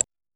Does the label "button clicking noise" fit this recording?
Yes